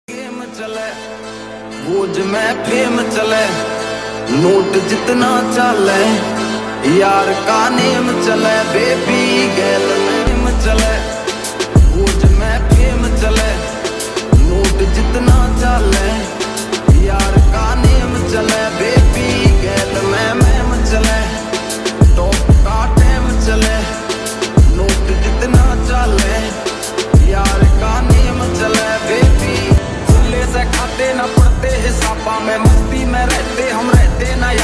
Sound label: Bollywood sad song remix Song